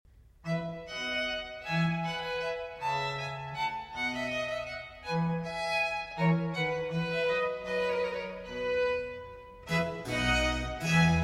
Concerto No. 7 in F Major for 4 Violins

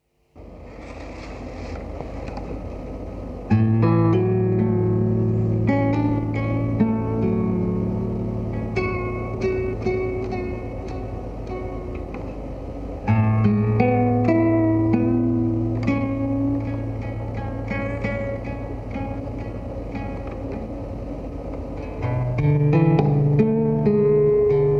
Double Bass

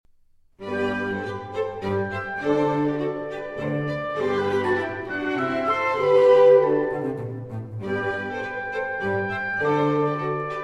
Oboe Concertino in G Major, Minuetto. Moderato; Bryan G5

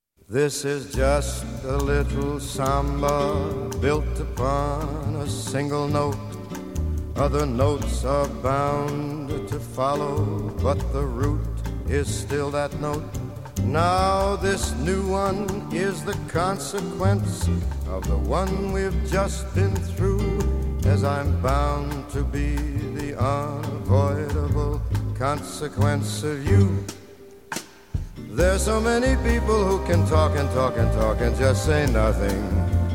Samba

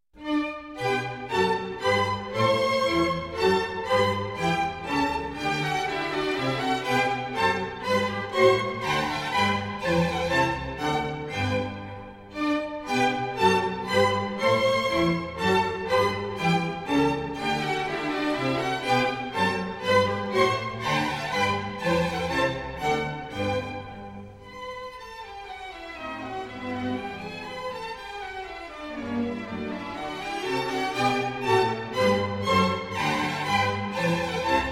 Serenade in G Major; Mozart Serenade in G Major, K. 525 Eine kleine Nachtmusik

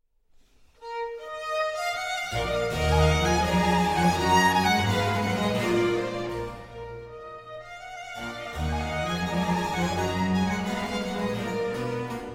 Concerto E Minor For Violin, Strings; I. Allegro - Andante - Allegro

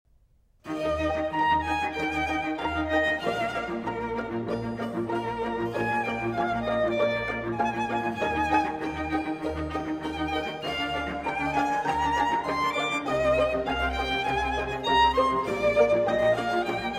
Violin Concerto in F Minor; The Four Seasons, Violin Concerto in F Minor, Op. 84, RV 297 Winter; Vivaldi

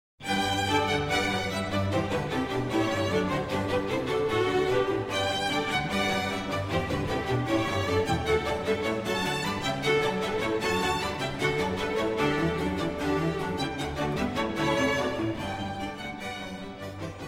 Concerto for Strings and Continuo in G major

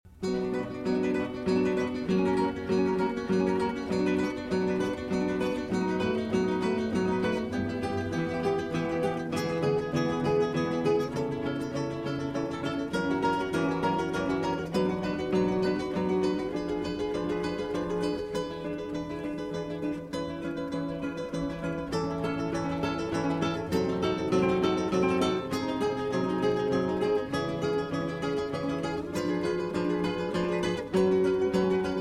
Concerto No. 10 in B Minor for 4 Violin

